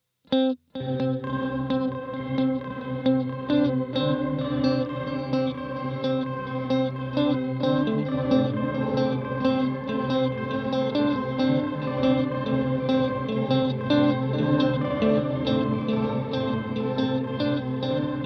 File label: WALRUS LORE Arps Chords